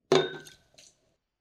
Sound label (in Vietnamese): tieng dat chen nuoc bat nuoc vang tung toe tren ban